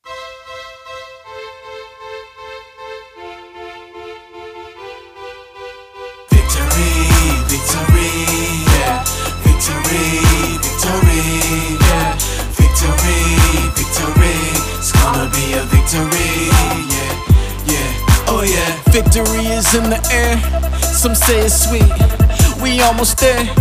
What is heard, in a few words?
victory